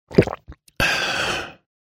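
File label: sounds of swallowing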